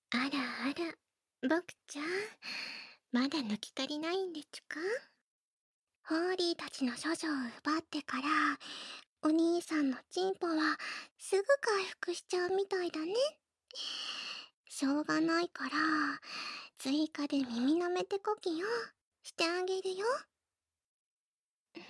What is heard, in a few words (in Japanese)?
両側耳舐め♪（効果音無し）; 変態な聖女たちに教会でこっそり性欲処理をしてもらうお話♪; ダチュラスクリプト; 淫乱聖女たちの、両側耳舐め♪